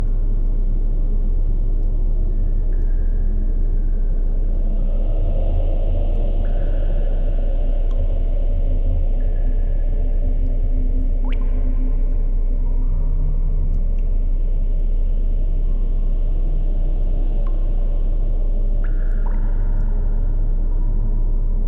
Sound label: Verdant CAVE AMBIENCE; Mindseize